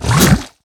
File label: spit; darkspitter